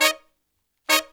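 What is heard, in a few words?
Pop & Funk Brass, 110 Eb, right; Best Service ProSamples vol.25; AKAI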